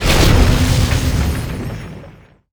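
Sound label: satanic gate death, monster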